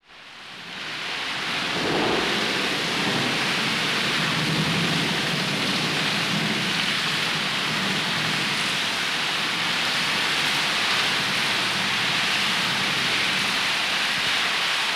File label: Rain Thunder in Distance; Nature Sounds; sound play workshop sounds